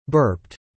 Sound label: burped; Excuse Me! A Little Book of Manners